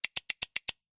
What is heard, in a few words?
Scissors